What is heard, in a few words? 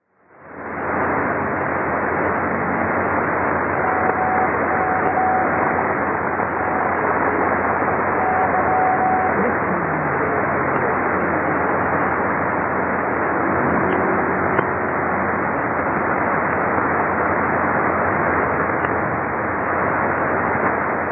6220kHz Mystery Radio